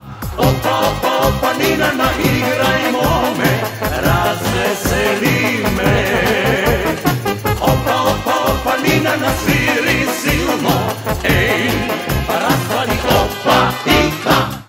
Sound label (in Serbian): Рингтон